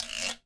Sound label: GUIRO